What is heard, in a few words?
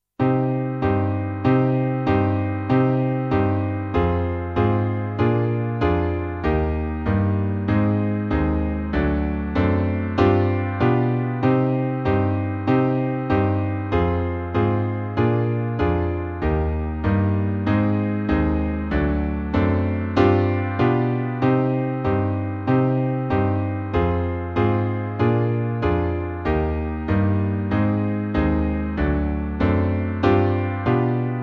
Backing